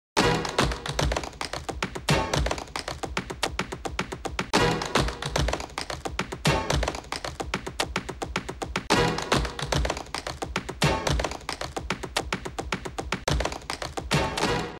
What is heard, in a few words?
spider TVC tapping